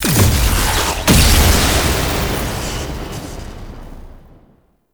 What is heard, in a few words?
Menu Select; Warframe Menu; Volt; Awakening Remaster